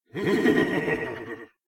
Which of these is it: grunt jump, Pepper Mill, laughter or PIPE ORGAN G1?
laughter